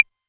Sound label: Clave, Novation Drumstation; Novation DrumstationV1